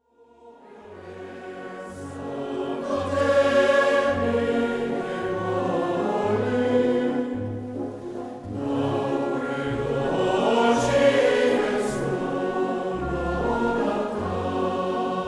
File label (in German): live chor 4 konzertchoere blasorchester